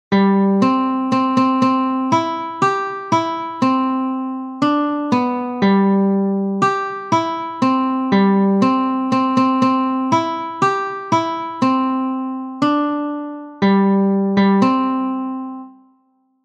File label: the wheels on the bus guitar several strings